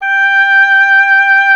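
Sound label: WIND OBOE; Miroslav Vitous - Solo Instruments VOL-2; Solo lnst. II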